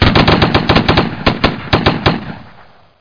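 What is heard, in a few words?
weapon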